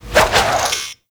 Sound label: Movement Flip Whoosh; Warframe; Kela De Thaym